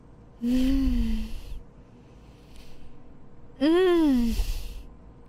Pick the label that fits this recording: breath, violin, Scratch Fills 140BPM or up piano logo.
breath